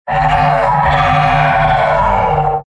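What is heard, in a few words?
pyro m pain critical death; tf2; garrysmod; mvm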